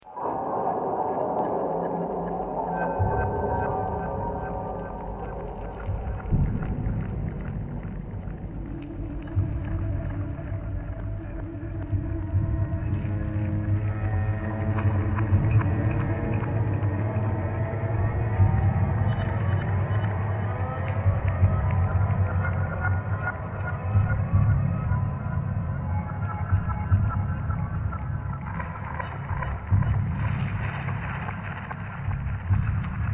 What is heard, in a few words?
mood; inFamous; PS3